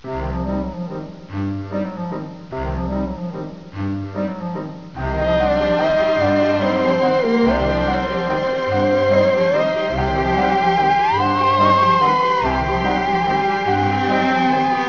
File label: alien tune; quake2; kitsune